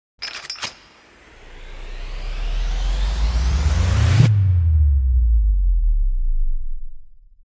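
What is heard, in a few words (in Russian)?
fantasy future weapon energy accumulation; speceffekti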